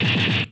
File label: Gunshot; BERZARRESOUNDZ